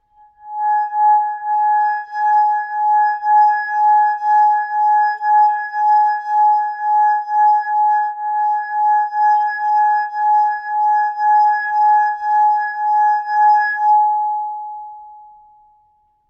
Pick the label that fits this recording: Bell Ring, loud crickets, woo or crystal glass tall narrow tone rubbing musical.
crystal glass tall narrow tone rubbing musical